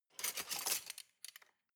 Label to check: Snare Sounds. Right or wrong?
Wrong